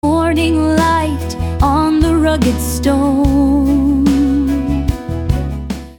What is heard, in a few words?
sting; cliff house maine